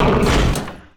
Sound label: Damage; LockJaw; Mob